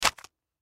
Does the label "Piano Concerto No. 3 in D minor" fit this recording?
No